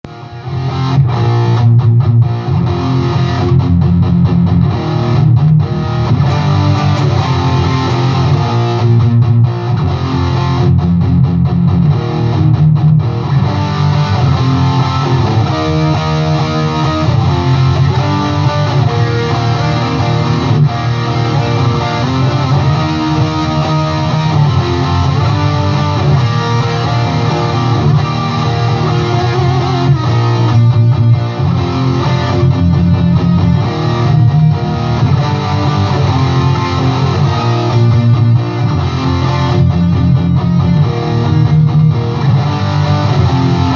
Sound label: Punk